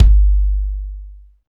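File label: kick, hip-hop kits, Roland; Northstar - Drumscapes Roland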